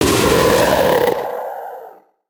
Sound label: pain